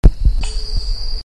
Carpintero Grande, Robust Woodpecker, Campephilus robustus; cantos